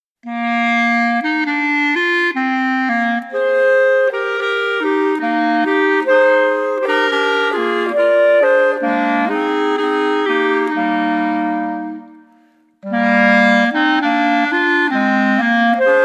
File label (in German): Klarinettenjodler, Klarinettentrio; Ueberseer